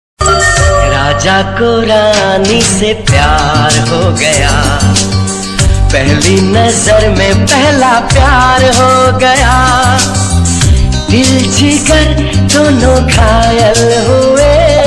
old hindi ringtone song hindi old romantic love ringtone; rajesh khanna